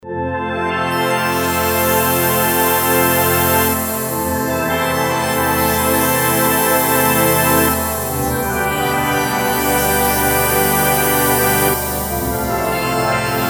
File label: pad shimmer eg, synth roland jd800